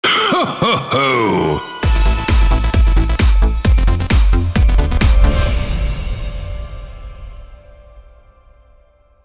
Sweeper; jingles noel; carpentras

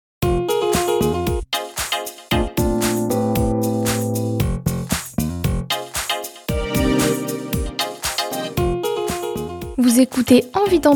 Reprise jingle; Esperluette; Envie d'entreprendre

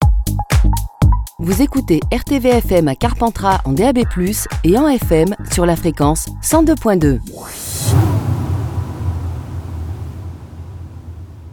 Jingle; Carpentras

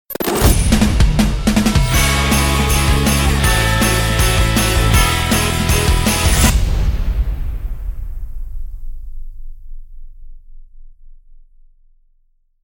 Sweeper

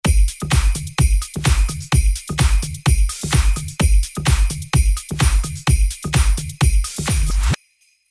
Kick Beats; pack noel

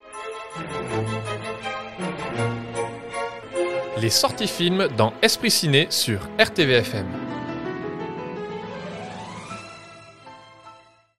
Jingle; esprit ciné debut

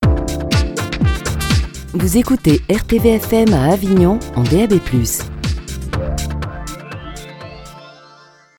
Jingle; Avignon

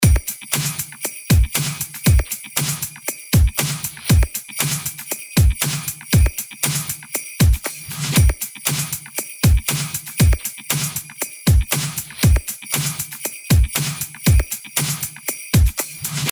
Kick Beats; pack noel

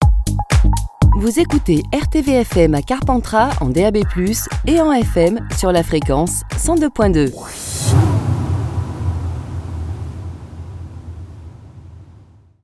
Jingle; Carpentras

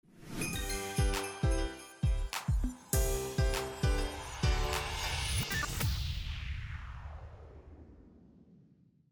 Sweeper; jingles noel; mac do

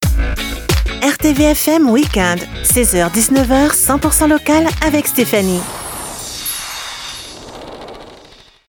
Jingles weekend

